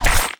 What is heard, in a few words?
Spear; Weapon